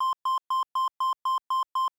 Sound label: Reload Now